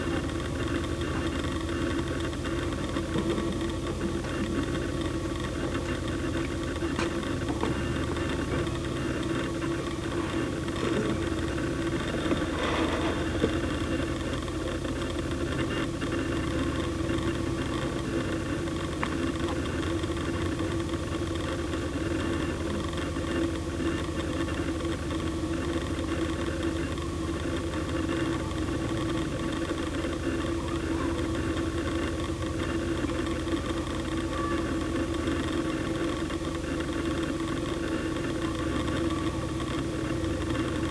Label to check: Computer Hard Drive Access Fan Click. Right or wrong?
Right